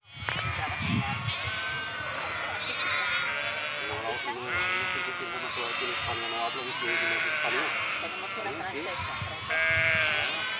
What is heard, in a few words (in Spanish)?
ovejas